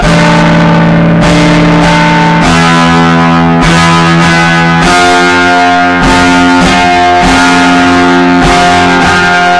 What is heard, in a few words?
progression, Guitar Samples, guitar samples with a lot of distortion